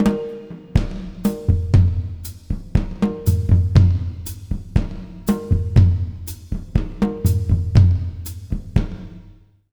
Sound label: 120 W side, jazz latin drumloops; USB Soundscan vol.08; AKAI